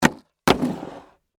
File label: Skateboard Jump, Wood, Surface; Sports, Skateboard, Mini; Land; Skateboarding Sound Effects; BlastWave